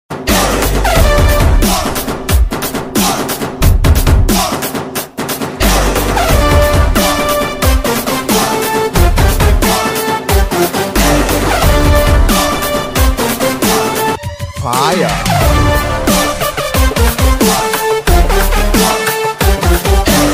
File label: title bgm ringtone; tees maar khan